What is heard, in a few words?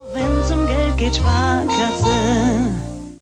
Jingle